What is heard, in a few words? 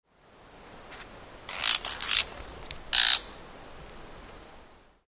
woodchat shrike